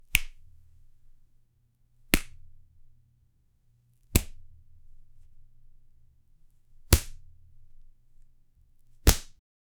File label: quickly squeezing a fabri, toy